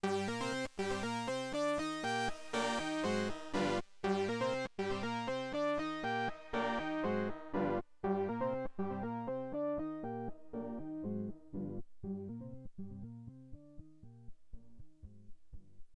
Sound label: Fancy Delay